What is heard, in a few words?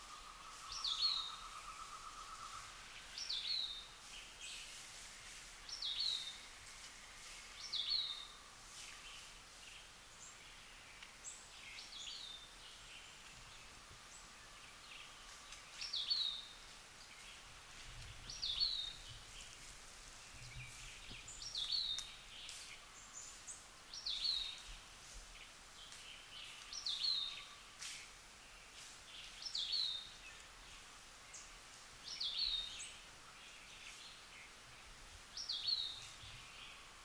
Cuban trogon